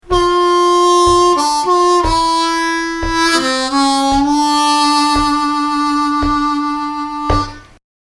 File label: Lick, harmonica